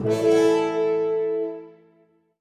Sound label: Castle Fanfare; Towers